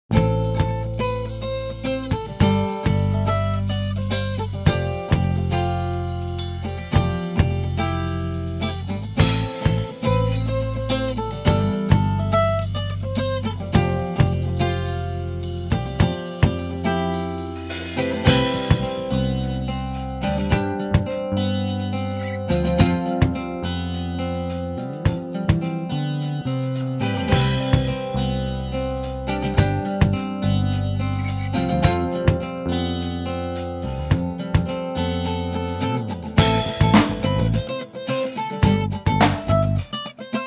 Instrumental

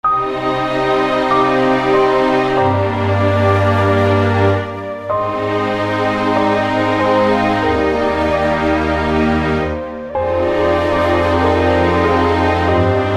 Flute version

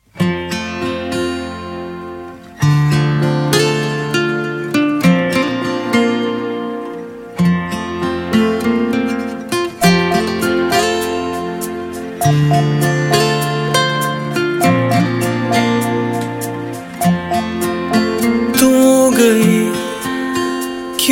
Acoustic

